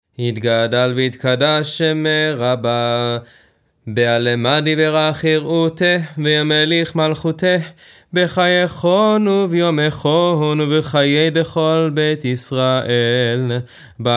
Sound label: Weekday Maariv Chatzi Kaddish nusach